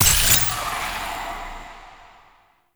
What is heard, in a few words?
Weapon Void Reticle Charged; Warframe; Weapons; Duviri; Incarnon Adapters